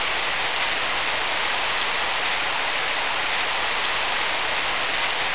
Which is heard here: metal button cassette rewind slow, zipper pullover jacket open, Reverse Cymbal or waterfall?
waterfall